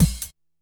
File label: kick hat, hardcore breakbeat; Big Fish Audio; Hardcore Breakbeat Action